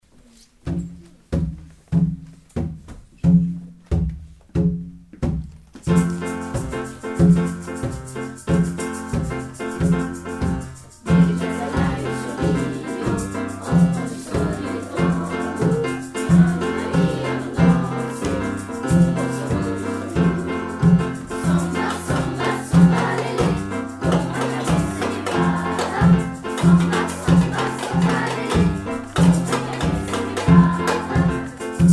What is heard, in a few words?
samba lele